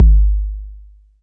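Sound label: bass drum 808 Sat Mid Decay C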